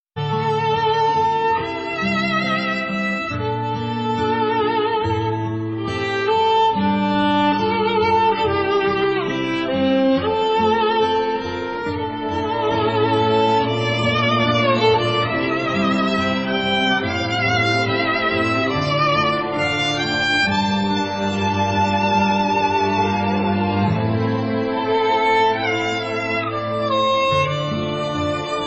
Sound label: Sad Violin